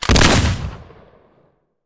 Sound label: shotgun